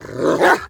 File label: attack; Rust